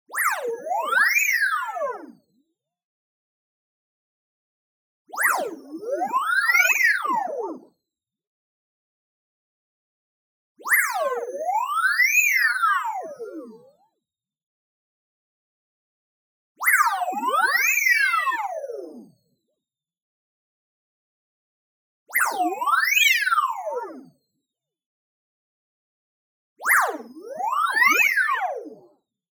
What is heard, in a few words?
tonal, shifted saw sine dual sweep; boom library; Anime Essentials